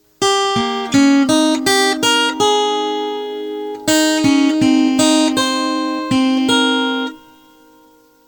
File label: jr chime